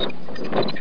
pulley; dosgames; roc

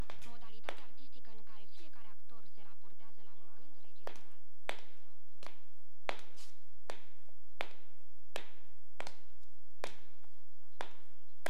trep tap

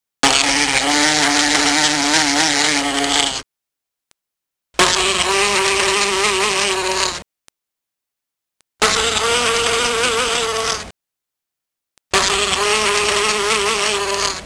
Bees, Cartoons; Soundsation

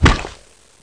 Stab; MacAddict; tommy

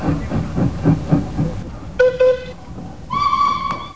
Train

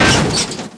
SCRAPE

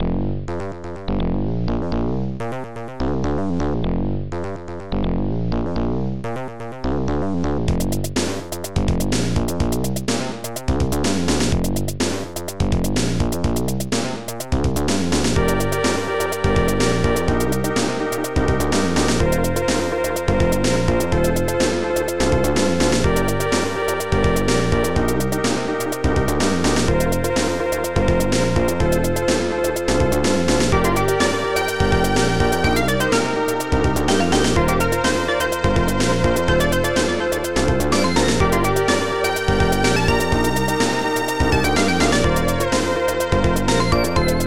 Little Funk

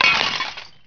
glass breaks; PC Gamer IT CD 41; Daikatana

